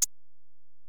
EPerc, Alesis DM-PRO; 300 Drum Machines

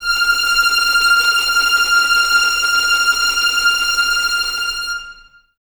Violins Tremolo F 6; Miroslav Vitous

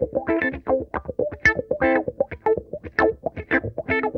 SYNCO, Funk Guitar; Zero G - Funk Guitar

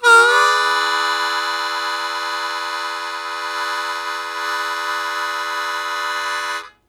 Blues Chord Attack E1, Harmonica; 90 s Sample CDs; Zero G

